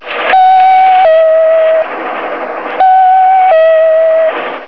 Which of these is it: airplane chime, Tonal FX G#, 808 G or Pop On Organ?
airplane chime